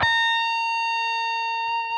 PRS lead, guitars; Spectrasonics; Hans Zimmer Guitars VOL-1

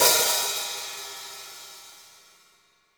hihat - opened; JazzFunkKit

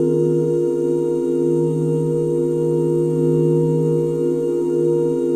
OOH D#MIN9; ILIO; Vocal Planet; Jazz & FX

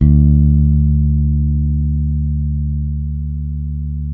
bass, sustain; Sampleheads; Will Lee Bass Library

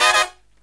HI CHORD, Brass

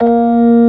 ORG. B3; B3 FULL

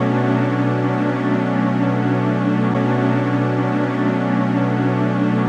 D&B Pad; neuro

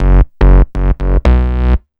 BAS, 2 Step; USB Soundscan vol.51; House Side Of 2 Step; AKAI

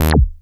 SHORT ACID, BASSES; Masterbits - Vintage Voltage; VV BASSES

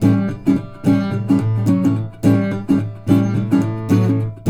Blues Guitar Samples, riff; ILIO - Fretworks

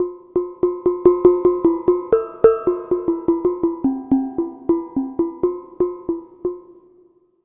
Pelog Ketuk Melody; Melodic; Ambient-Melodics-II

